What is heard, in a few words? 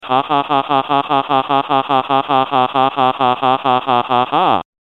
pyro laugh; John Madden